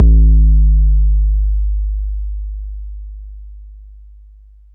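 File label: bass drum 808 Smooth C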